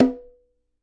bongos bongo; ETHNIC and WORLD PERCUSSION; Eight Bongos

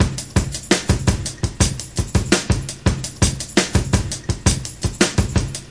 offbeat vinyl, 084 bpm, drum loop

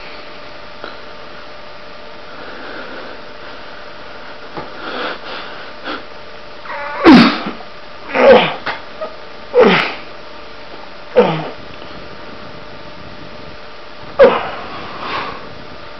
man with volcano grunts, Silly Lo-Fi Orgasms

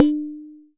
Jomox MBrane, drum machines; Jomox MBrane 11

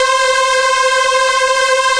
Synthesizer Middle C Notes, Lead

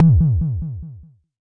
Jomox MBase, drum machine; Jomox MBase 01

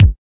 kik

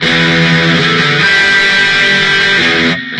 big crunch chords, guitars

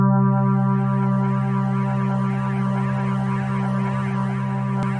ADD Synth C2, Many Harmonics Synth

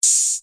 Hats, Roland T6; DRUM MACHINES

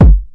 bass kickers; Kicks; single hits

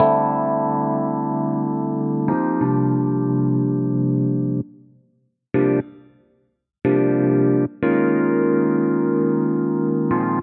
092 rhodes jazzy chords; KEYS ORGAN PIANO LOOPS

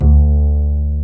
Plucked Bass D3, Upright Bass Plucks

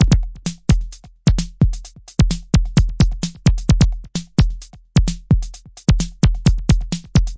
130 analog, drum loops and breaks; 121 to 130 bpm; soundsonic nks analog berlin

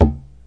"gi", Tabla

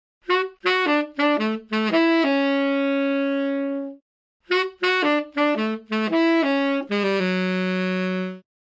tenor

